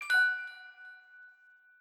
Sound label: trill, notifications; OnePlus 5T Stock Ringtones